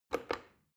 plastic button start click regular fast

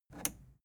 plastic button scratch release disable

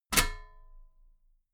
metal ladder step heavy

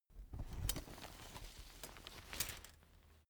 umbrella big close full soft

